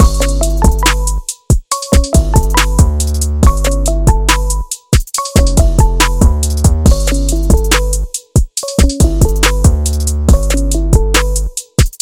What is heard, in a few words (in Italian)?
remix chiamate hangouts